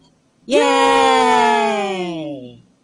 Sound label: Yay